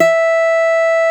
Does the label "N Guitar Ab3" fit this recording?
Yes